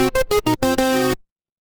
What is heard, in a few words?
retro game game over